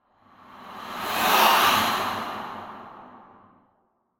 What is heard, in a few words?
Ghost (strong attack); Phasmophobia